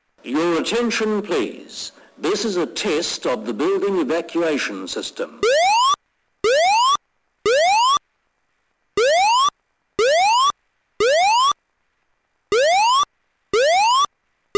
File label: Tone